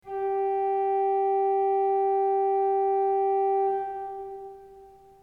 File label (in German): ton, orgel